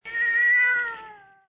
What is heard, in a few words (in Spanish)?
gato